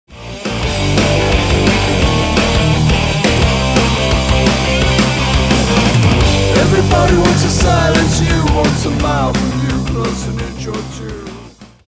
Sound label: Backing Track